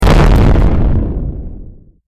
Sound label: explosion